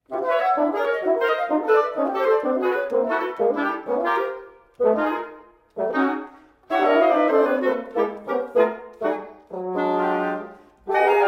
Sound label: wind quintet, allegro